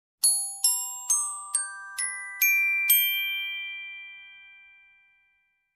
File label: Glockenspiel happy melody